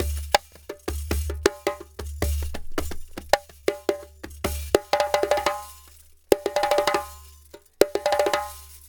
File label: Djembe with Kessing, African; Sonic Foundry (Sony Creative Software); Continental Drift Aftershocks